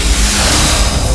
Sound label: ring transport